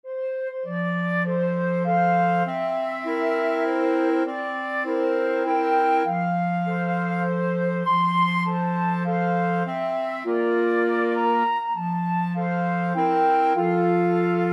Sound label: happy birthday, wind quartet